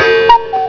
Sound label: CUCKOO